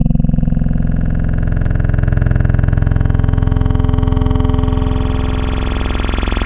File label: Vocal - Yelling; Wavetables; Synthetic's Serum Collection; ESW Core Wavetables; Echo Sound Works Core Tables